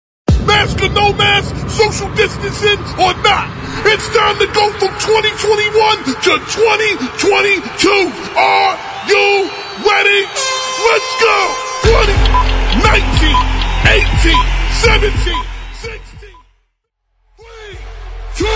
Sax; Happy New Year

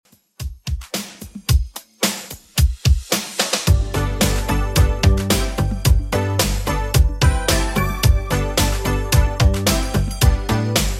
80s Redrum 110 bpm

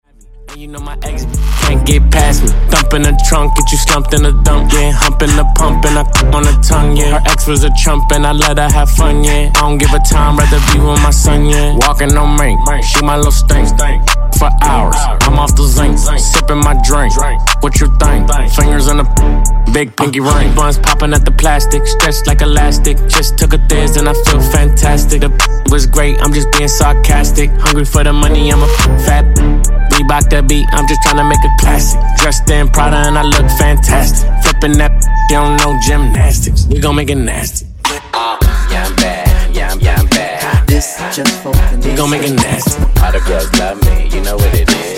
Funk Segue 106 98 Bpm

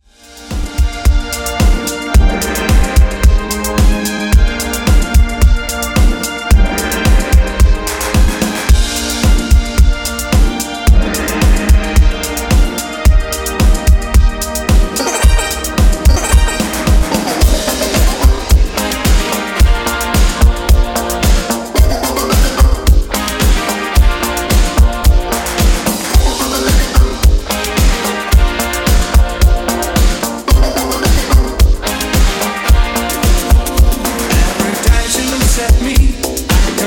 Classic Redrum 110 Bpm

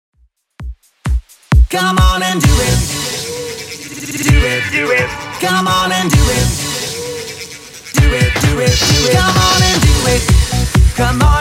90s DJ Friendly Redrum 130bpm

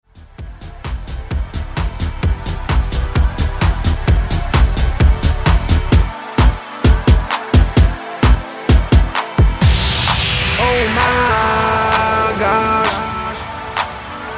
Segue Mix

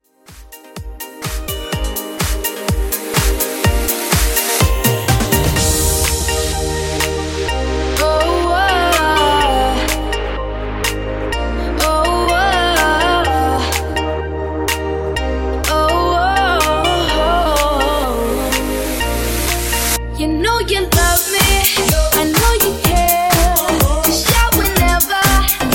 Club Bootleg 125Bpm

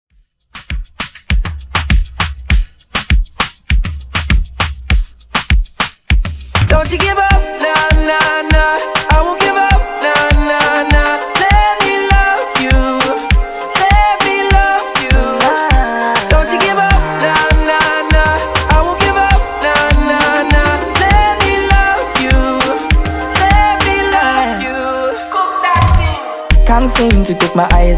afrobeat; Dj Intro

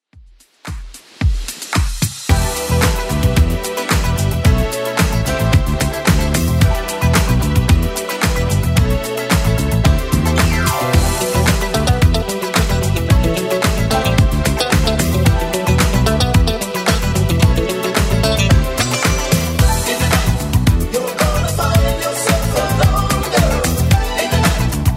80s Club 110 bpm

